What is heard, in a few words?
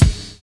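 NICE snare; Univers Sons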